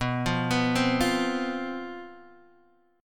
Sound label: C Major 7th Suspended 4th, guitar